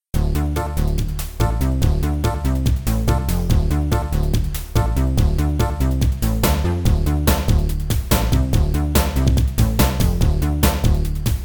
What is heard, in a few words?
(Sound Blaster Version); Foreign Shores; zone 66